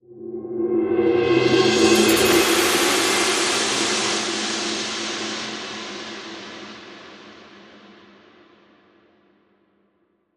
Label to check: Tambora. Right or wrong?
Right